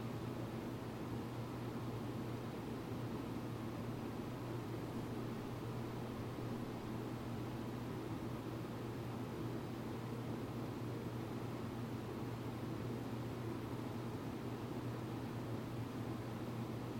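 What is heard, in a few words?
large distinct incessant calm sound of production work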